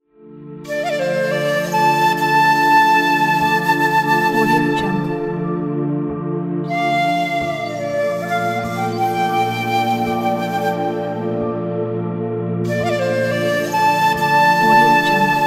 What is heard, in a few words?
peaceful calm meditation nature world music